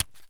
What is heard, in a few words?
generic hand hit; Escape from Tarkov